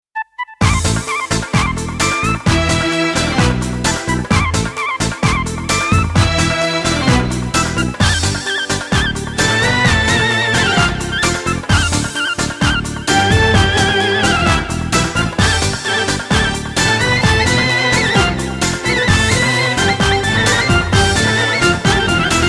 orient music

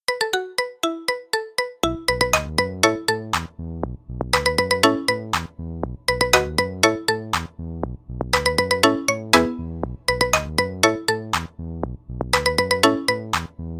marimba remix

